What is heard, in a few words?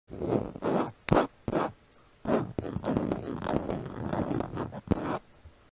leather fabric; پارچه چرمی